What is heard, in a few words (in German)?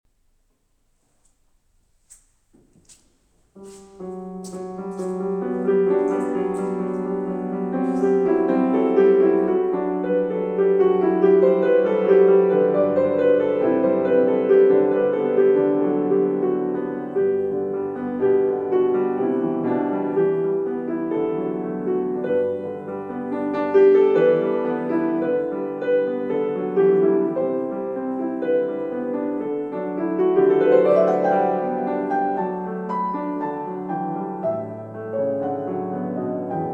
Klavierimprovisation; Brunn alles Heils; EG 140